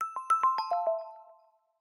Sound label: Windows Notify Calendar